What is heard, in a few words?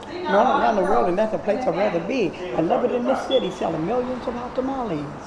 rap; wilsons tamales family